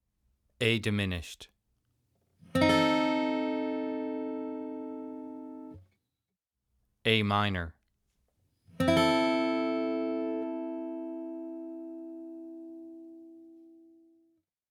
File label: A Diminished Chord